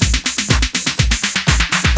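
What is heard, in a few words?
808 snare drum FALL, BREAKBEATS; Crypton - DrumBase5000; BREAKBEATS#2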